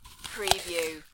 Eating, Bite, Munch, Crunch, Person Bites Into Apple